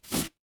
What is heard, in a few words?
paper fabric tear